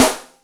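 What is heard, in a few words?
12 Soprano, Yamaha DTXpress Acoustic Snare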